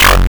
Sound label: Portal